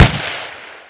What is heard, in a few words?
cannon; havoc